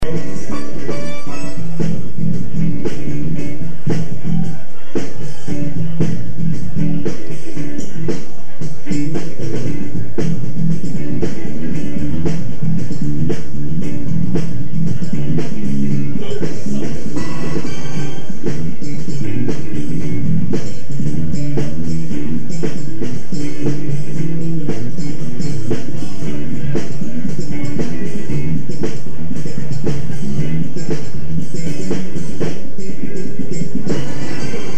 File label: bass